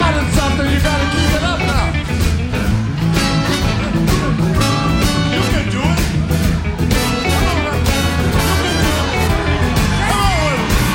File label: Singing; Obama